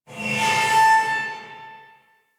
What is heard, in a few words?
Metal Screech; Ambience; Warframe; Grineer Galleon